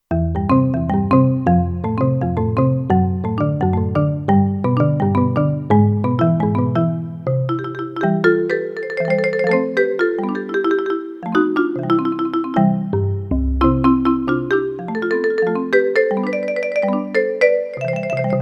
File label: Marimba, Kurzweil K2500X; Factory Programs